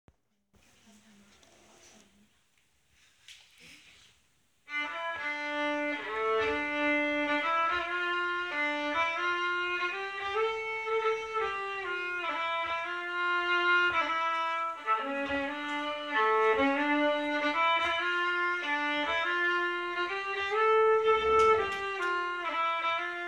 Vals stämma; Vals från Vilhelmina

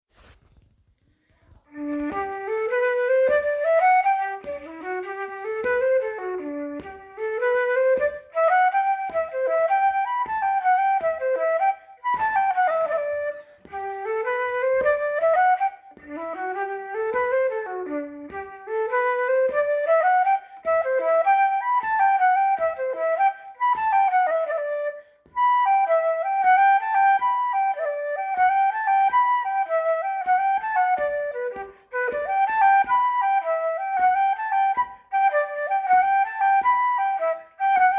Tjugoårsvalzurkan

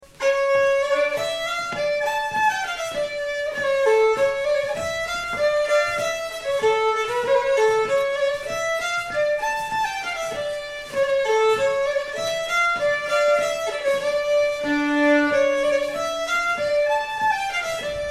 slangpolska; jeppssons

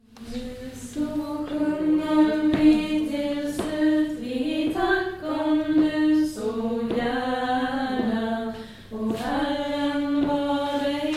2a Stämma; Tackvisa från Bergsjö

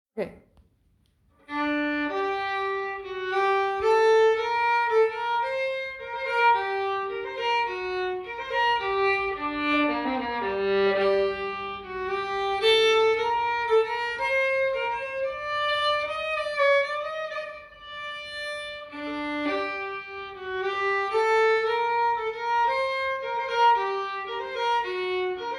Brudmarsch från Malmberget

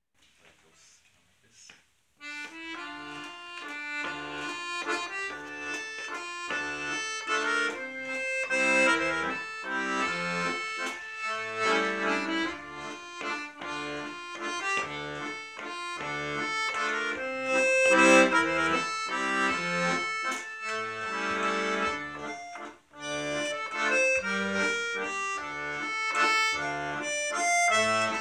Vals från Vilhelmina

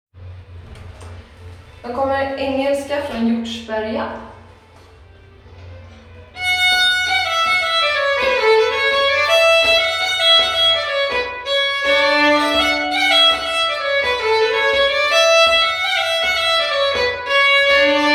Engelska från Hjortsberga

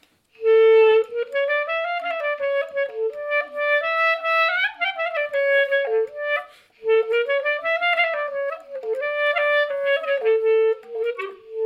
Freylekhs på klarinett

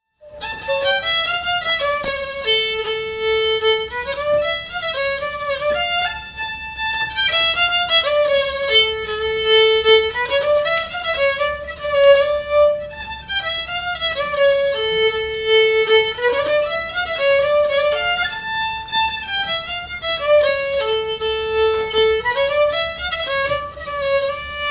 Schottis från Idre

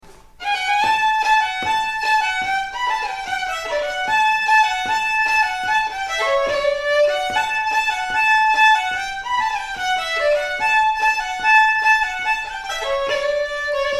schottis

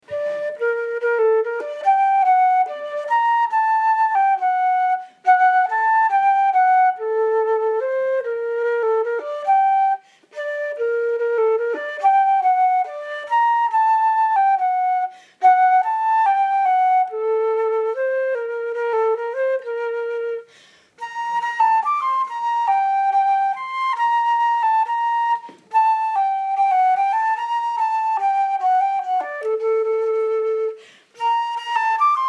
Smygevalsen Stämma

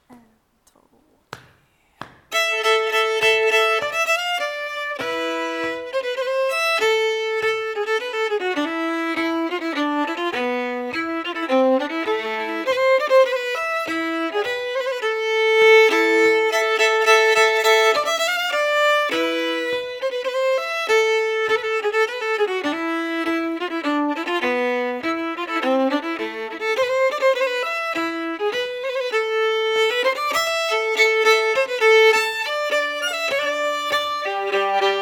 Lillpolska från Gnarp

